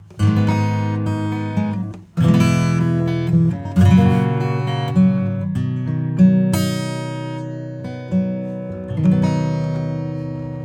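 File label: acoustic compressor; Titanium 3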